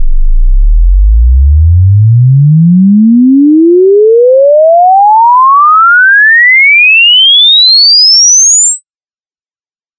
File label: sweep